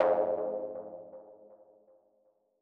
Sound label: Mono DBeat F2; KG MegaSaur; M8